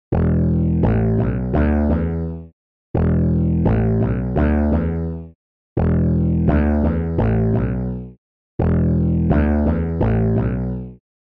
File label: mellow sweeping bass dry